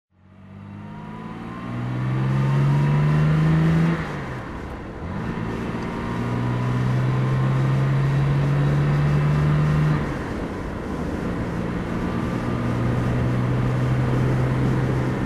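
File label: jag E type 38ots driving, Engine sound driving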